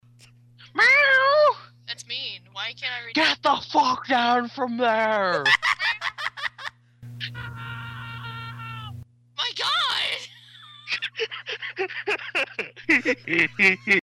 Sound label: screams; eliwood